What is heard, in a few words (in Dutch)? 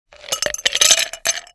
ijsklontje in glas